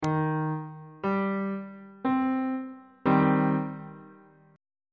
b sharp minor 1st inversion

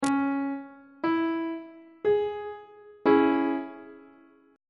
d flat minor chord; on treble clef

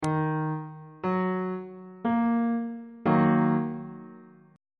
d sharp minor chord on bass clef

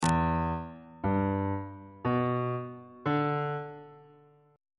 e aeolian 7th chord 1 is e minor 7th on bass clef

